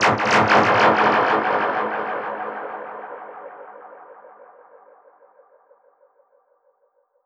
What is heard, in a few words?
Perc Hit, dub percussion